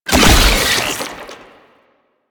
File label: Proto Infested Babau Passive Tentacle Spawn, Enemies